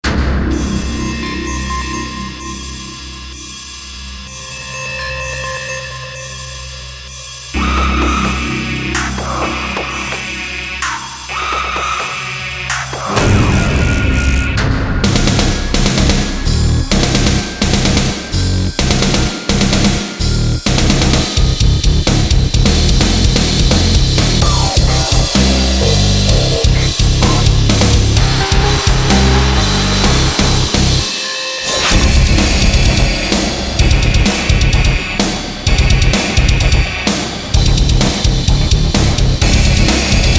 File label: drums